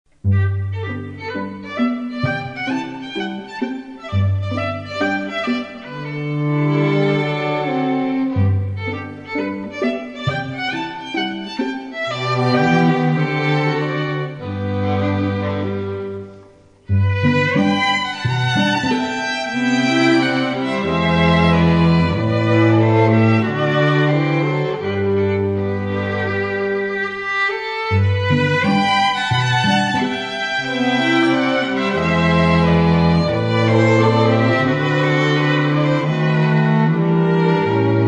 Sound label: Quartet Humoresque